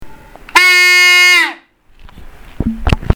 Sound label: trump horn mini